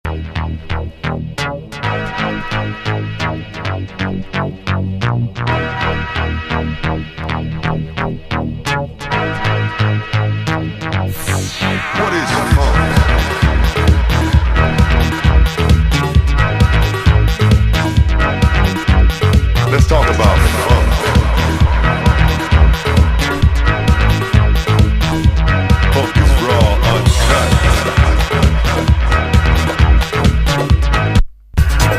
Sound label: Funk